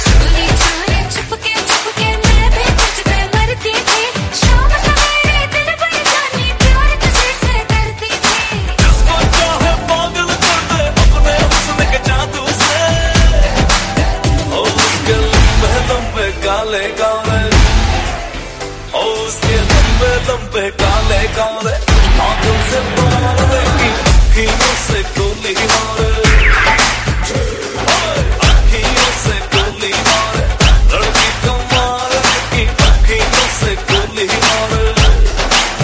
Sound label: Female Ringtone